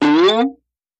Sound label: Boing Up